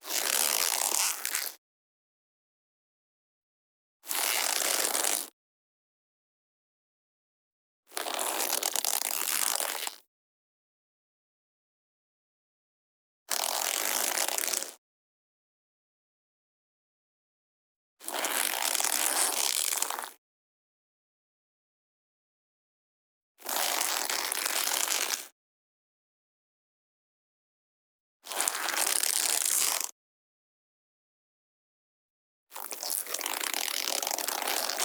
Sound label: SQUEAKS AND CREAKS Leather Jacket Creaks; Squeaks and Creaks v2; GDC Game Audio Bundle